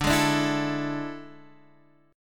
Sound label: A Major 7th Suspended 4th D